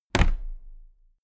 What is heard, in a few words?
toolbox place upright heavy